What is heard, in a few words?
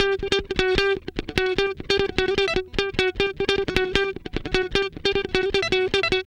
Guitar Licks; Best Service ProSamples vol.17; AKAI